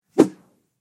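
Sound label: zvuki vzmaha, swing whoosh sounds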